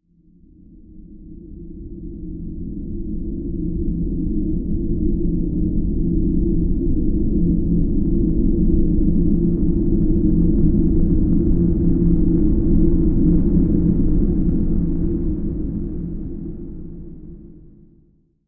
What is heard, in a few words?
Bed Alien World Low En